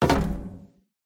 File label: metal step; Golden Crayon Sounds